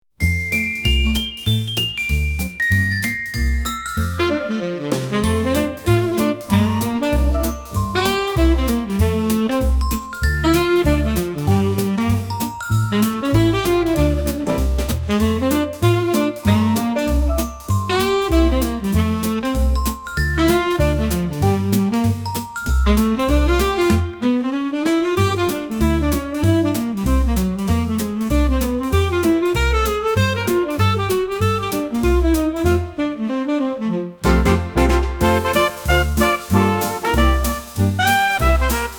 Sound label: christmas jazz sleigh bell swing